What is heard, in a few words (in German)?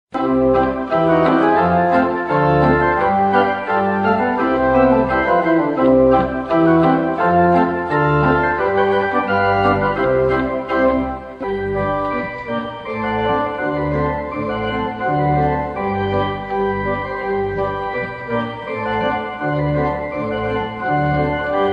Schottisch in C-Dur